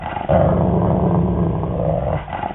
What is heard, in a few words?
angry; tamagotchi